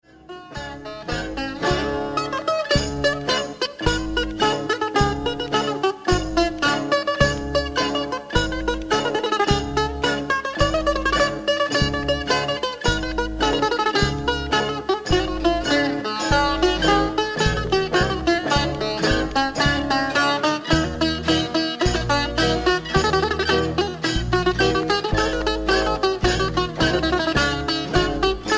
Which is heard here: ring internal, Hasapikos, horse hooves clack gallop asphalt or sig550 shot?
Hasapikos